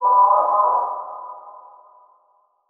Title Transition Feedback Blast; Adobe; Production Element

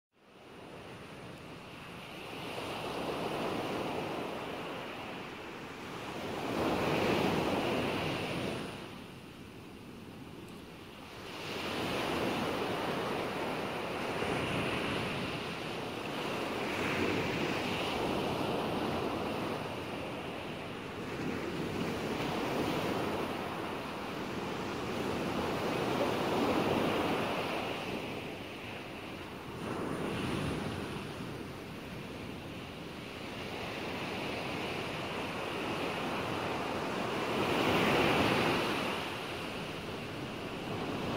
Calming Seas Ocean Waves; 11 Hours; Relaxation Atmospheres

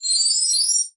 Sci-Fi Electronic High Pitch Ringtone Flutter; Science Fiction

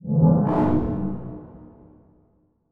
Transition Whoosh Drone; Production Element

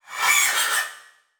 Multimedia Internet CD-Rom Flash Animation

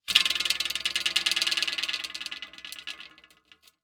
Foley Metal Pole Cinder Blocks Rattle Tight

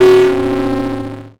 Transition Hit Impact Distorted; Adobe; Production Element

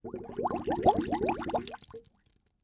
Liquid Water Bubbling Deep To Shallow Short

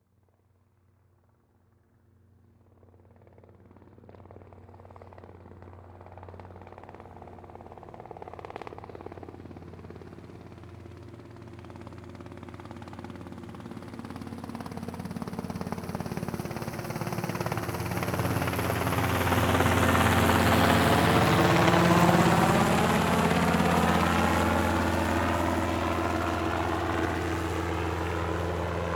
Helicopter Fly By Slowly; transportation

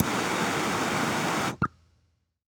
Industry Tool Torch Blow Torch On Off

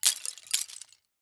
Impact Twigs Hit Concrete; Impacts

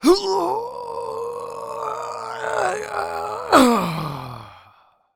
Human Male Groan Lift Weights

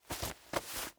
Household Cloth Brush Off Sheet

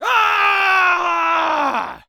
Human Male Angry Scream

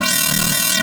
Sci-Fi Electronic Electric Flutter; Science Fiction

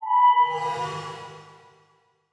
Transition Metallic Hit To Whoosh; Production Element

